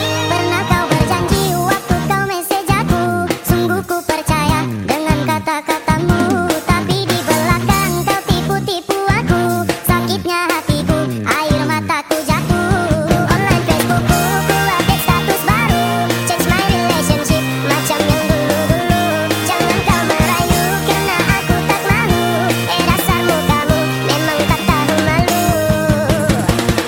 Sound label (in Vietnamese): Bai hat